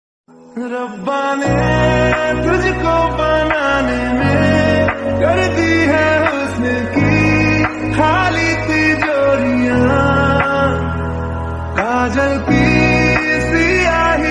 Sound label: Bgm Hindi